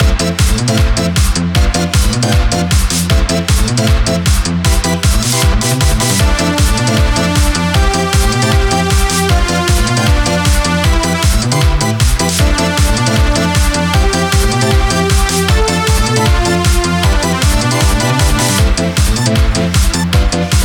cool highway climax bpm155, Techno music for racing and action games.